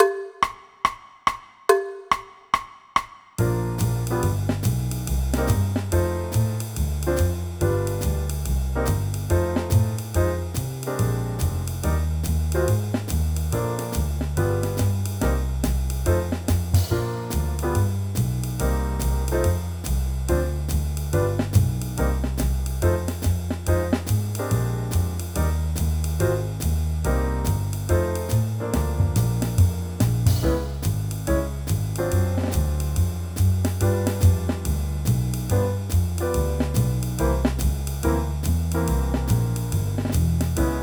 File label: Senior Trumpet Playalong